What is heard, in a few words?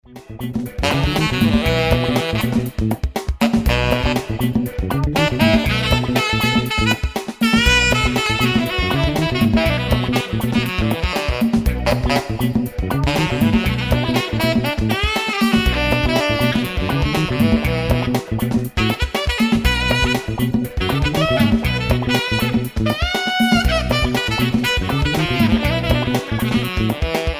Funk; SAXZ2